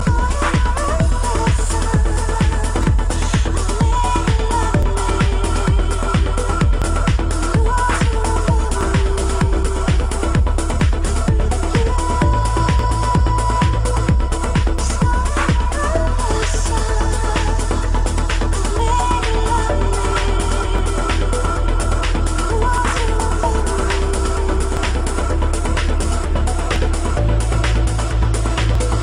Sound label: progressive vocal, electronic ringtones